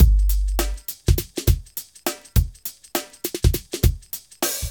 102 BPM

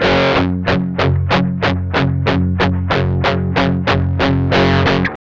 MED ROCK 8TH G 2, Guitars; Spectrasonics; Hans Zimmer Guitars VOL-1; 102-MED ROCK

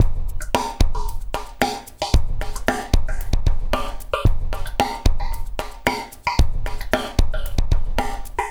LQT AMB, Liquid Grooves; Spectrasonics; 113 LIQUITEK

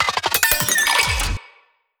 175 glass fill

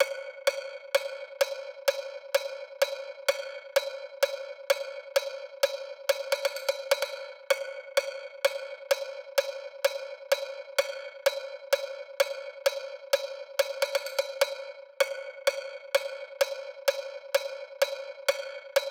128BPM Cowbell; Essential Clubsounds Vol.5; Let's Work It Kit; Root F#min; Drums & Perc

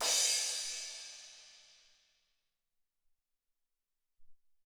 16 Crash, Alesis SR-18

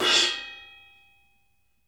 RIDE CHOKE, RIDES; Sampleheads; New York City Drumworks VOL-1; SP RIDES

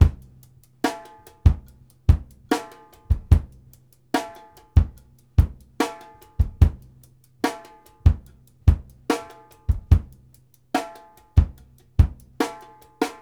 FUNK KICK A, 72; Sampleheads; New York City Drumworks VOL-1

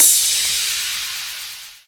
Crash, Cymbals

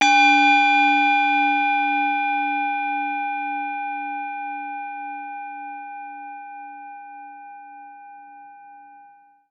bells, bowls

